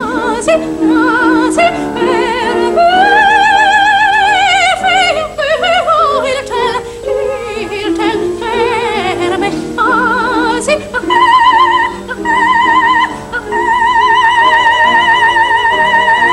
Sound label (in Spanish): las mejores sopranos